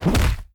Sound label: punch